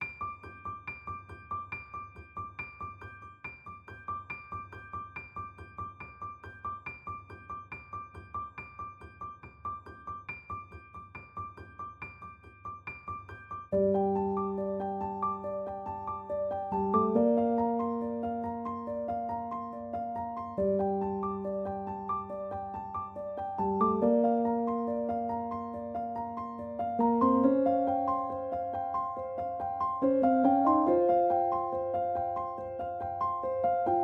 Piano

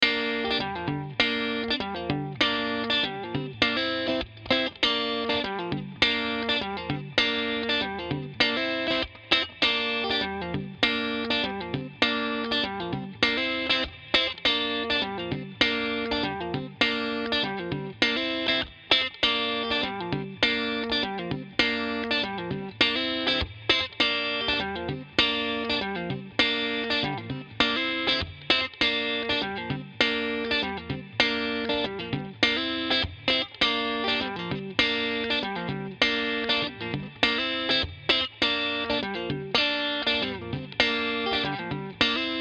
Gm Rock Groove; admiralbob77

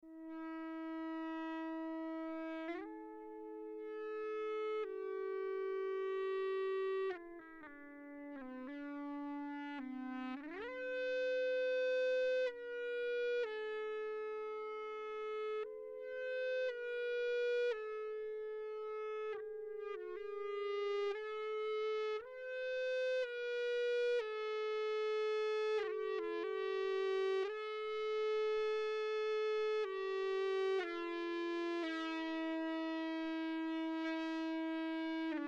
Ebow; Mille Regretz

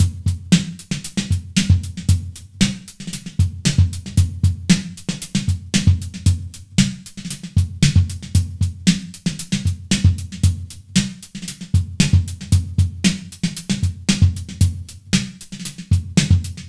Designer Drums

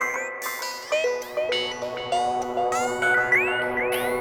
synth Glitch Rhythm 100bpm G

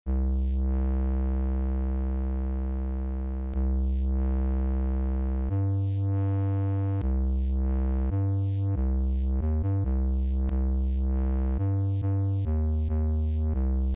Sunshine Deep Bass

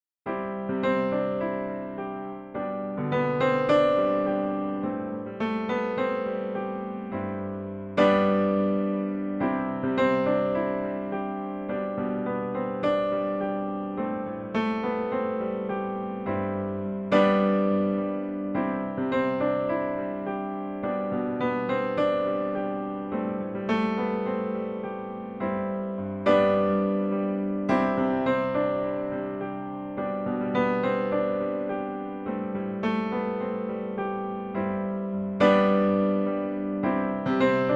Acoustic Repair Job; admiralbob77

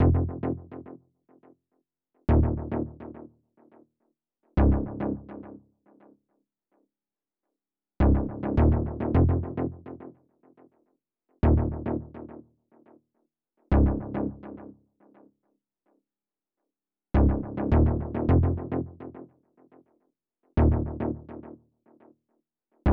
105bpm Distance Multiple Samples

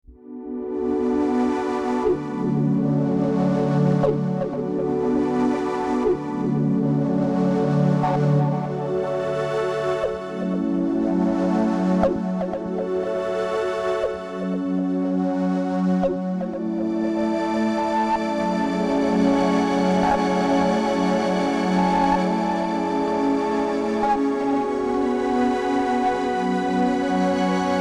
Bm 120 Korg chords

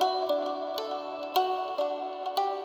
synth Far Away 100bpm G

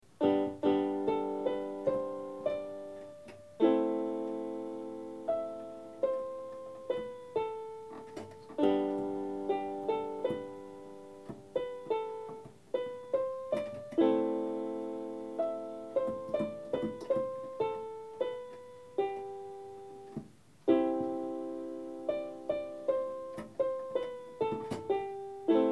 Keyboard Practice Improvisation

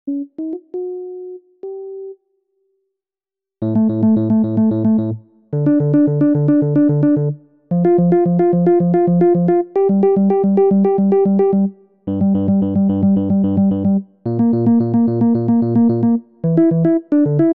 Synth

